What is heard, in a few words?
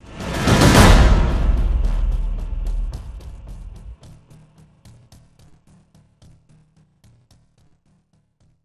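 stinger percussion; transformers war for cybertron gamerip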